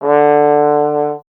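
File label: Brass Trombone D; Miroslav Vitous - Solo Instruments VOL-1; 90 s Sample CDs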